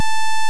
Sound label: saw; JaySynth